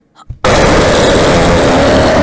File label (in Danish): skrige mand